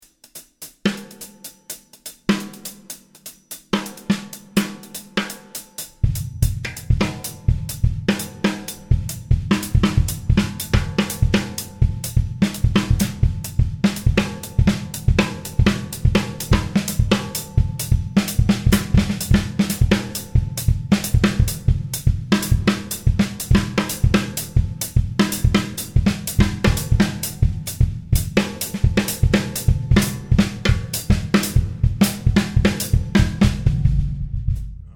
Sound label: drum track; Garcia kit